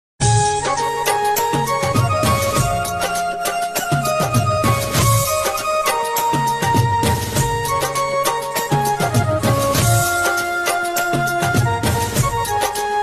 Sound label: instrumental